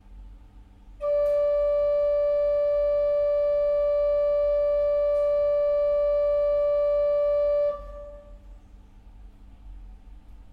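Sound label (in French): flute 4, Orgue; Ce petit orgue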